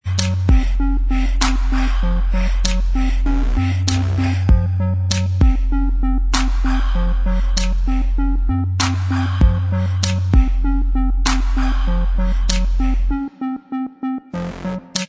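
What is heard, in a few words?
instrumental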